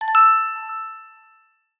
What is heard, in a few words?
Dewdrop, notifications